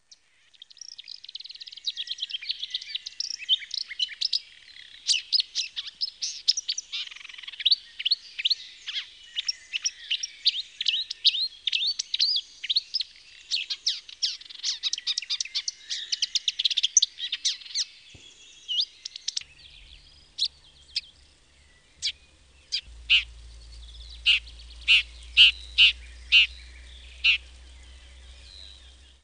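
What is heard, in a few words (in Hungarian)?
Tövisszúró gébics; Madarak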